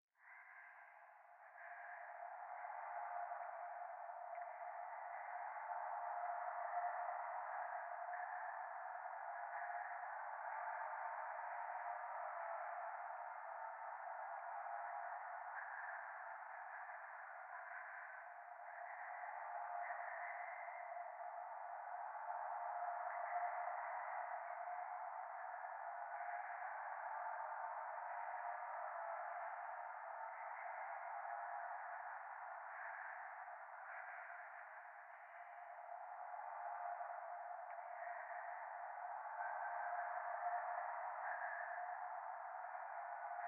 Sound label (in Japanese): ゴースト 右耳快感吐息 車の中